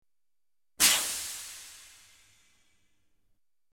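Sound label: Respirator Air Exhale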